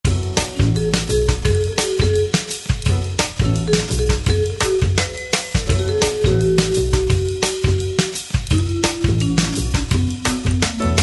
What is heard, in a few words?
jazz